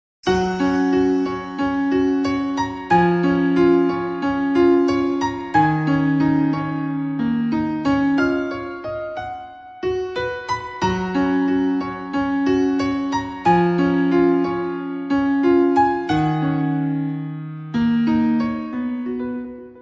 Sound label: piano